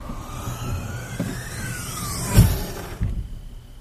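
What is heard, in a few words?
Hatchback Hydraulic Long, trunks & hoods; Hatchback Hydraulic Longer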